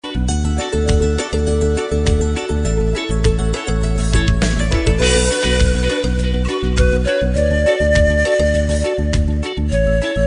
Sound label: ocarina